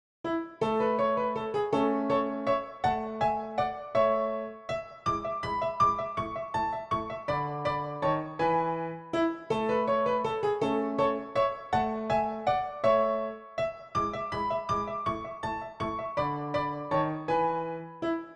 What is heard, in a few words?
Dance PianoTeq Bechstein; Dittersdorf 20 English Dances Schott